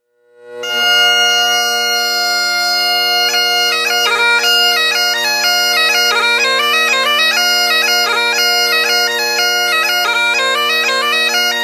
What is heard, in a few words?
Jigs, bagpiper